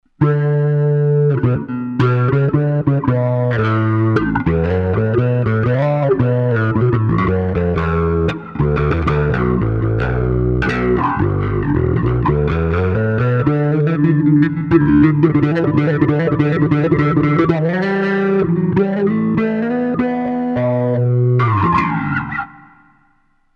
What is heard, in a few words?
EMOTIONAL TALK, Zoom 506 II